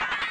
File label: Spike Roll; Zelda; OOT